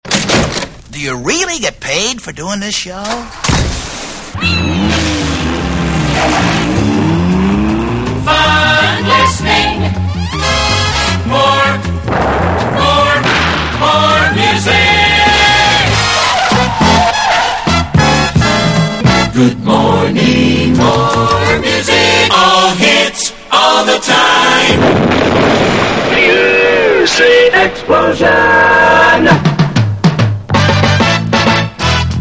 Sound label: jingles DJ